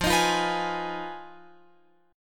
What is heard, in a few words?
F Minor 7th Flat 5th, Guitar, Open F; Fm7b5; strummed; x,12,15,12,14,15